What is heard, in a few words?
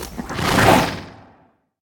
chariot horse breathe; smite; Apollo